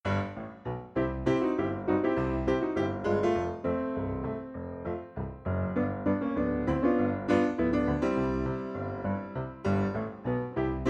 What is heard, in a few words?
music look busy; Bugs Bunny - Carrot Chase